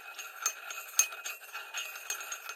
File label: Spoon Rotate; Game rip; MiSide